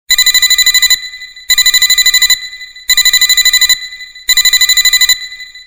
Echo Msg Alert

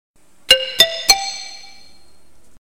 Xylo Sms

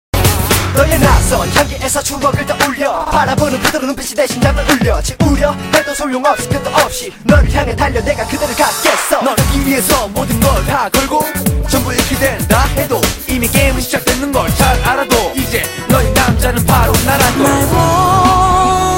rapping

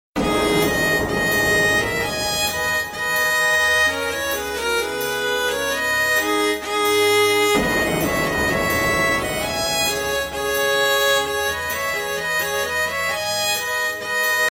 Nokia Lumia New

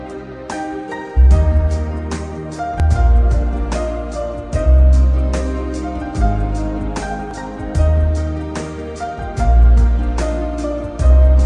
Piano

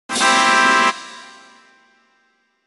Success; BanjoKazooie

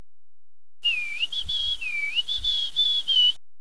Tune

